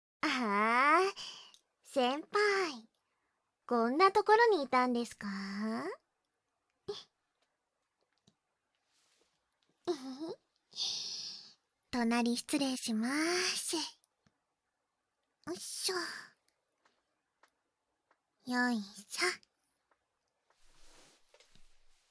屋上でこっそり耳舐め（バイノーラルマイク 効果音なし）, ASMR; お昼休み

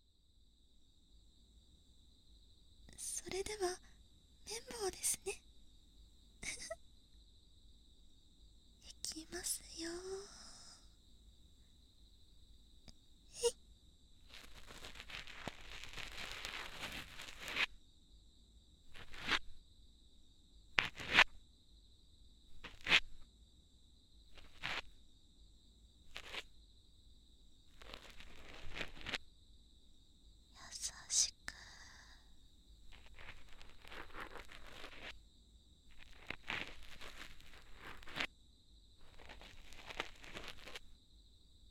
左綿棒, ASMR; お隣さん秋

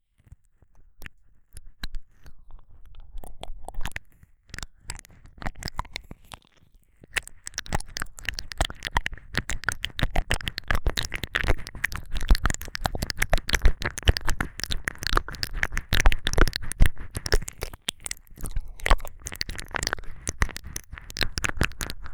舌を感じる耳舐め

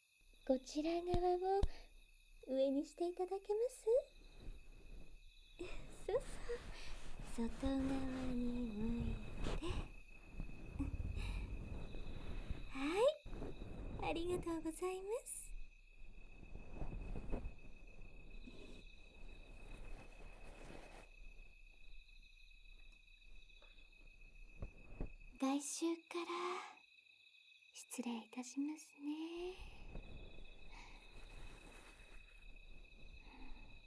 右耳かき; 【田舎耳かき】道草屋 芹-ご連泊【ソルフェジオ音源】; 一日目

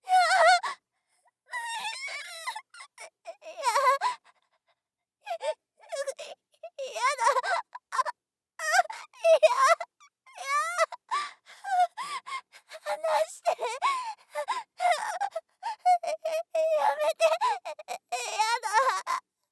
襲われる; 効果音なし

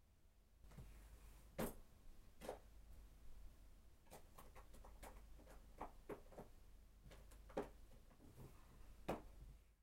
耳かき道具を取り出す; 夏実の耳かき

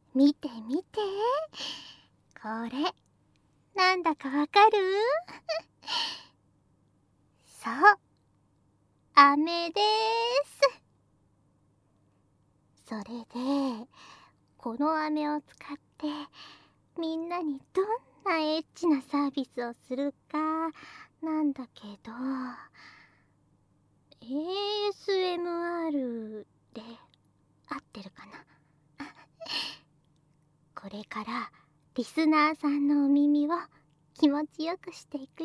妄想膨らむ疑似フェラ(効果音ナシ)

